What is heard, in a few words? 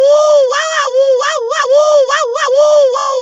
wawawoowoo